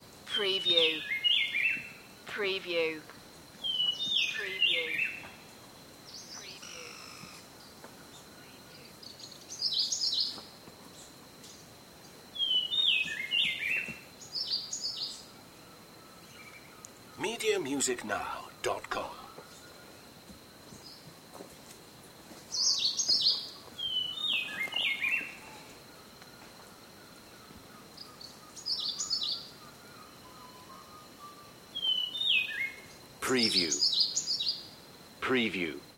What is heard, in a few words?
beautiful birdsong